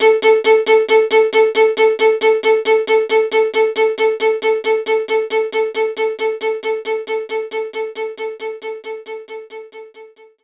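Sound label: Violin Strike A3; knights try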